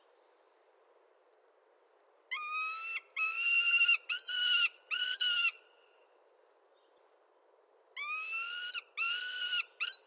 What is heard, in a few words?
Prairie Falcon, Falco mexicanus; raptors